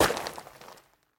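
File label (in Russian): удар ногой по мусорному пакету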